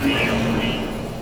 alarm

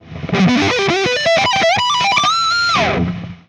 tapedeck

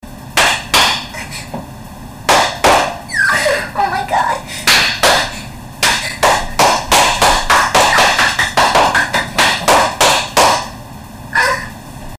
loud moaning and cheek slapping

